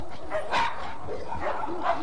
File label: far off filter dog fight; Zoo and Nature